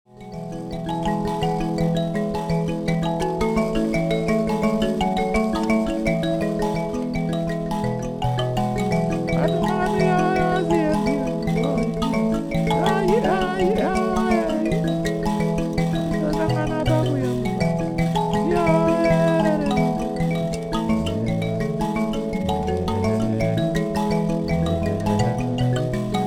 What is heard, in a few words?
mbira